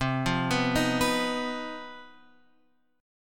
C Minor Major 7th Double Flat 5th chord, Guitar Open F; arpeggiated; JGuitar